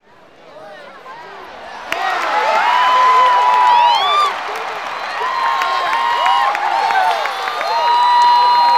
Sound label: BASEBALL CR, Baseball Crowd; SFX